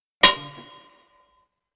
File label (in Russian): zvuk lopaty o kamen